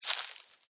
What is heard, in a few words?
Footstep: Individual Footstep On Dry Leaves